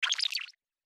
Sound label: game health pack pick up